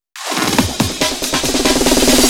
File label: Loops Fills; Vengeance Samples